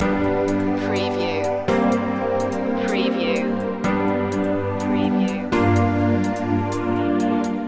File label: groovy background loop